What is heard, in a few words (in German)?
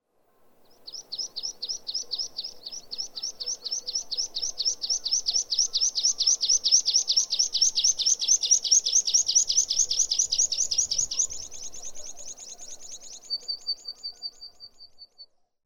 Morgens auf dem Pass, Vogelwelt Pyrenaeen